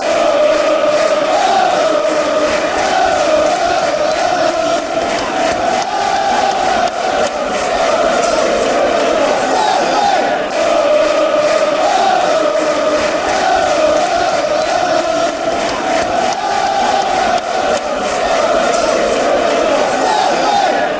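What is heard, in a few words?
fanchants, football chants; southend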